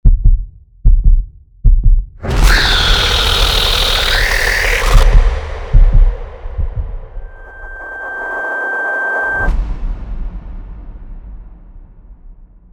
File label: Jumpscare sound design